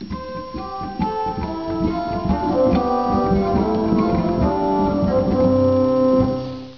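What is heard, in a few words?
Salicional 8, organ; Martin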